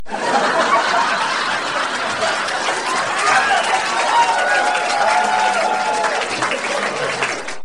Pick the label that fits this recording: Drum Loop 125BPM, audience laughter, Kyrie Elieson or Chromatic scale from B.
audience laughter